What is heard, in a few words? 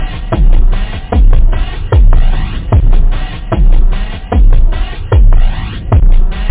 hihat mod, loop; da capo vol1; instruments